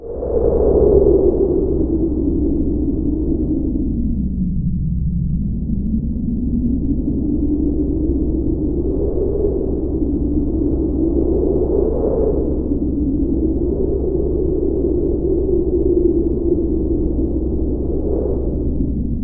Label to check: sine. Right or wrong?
Wrong